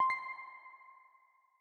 graphics soft pluck confirmation; soundscrate

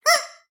Squeaky Toy; SoundsCrate

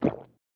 gulp; soundscrate

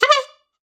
Bycicle Horn; SoundsCrate

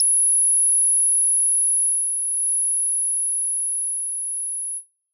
tinnitus; soundscrate

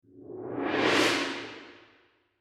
gong roll cutoff

